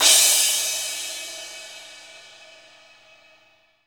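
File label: CYM CRA, cymbal crash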